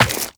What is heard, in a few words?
Run Rock, Footsteps; Warframe; Tenno